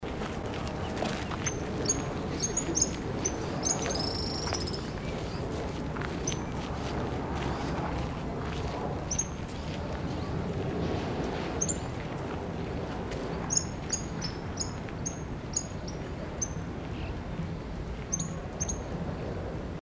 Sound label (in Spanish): picabuey voz